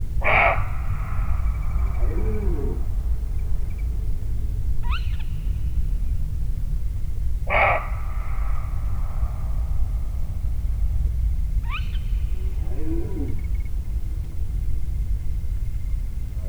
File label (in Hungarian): gímszarvas és madár is, európai őz; termeszetben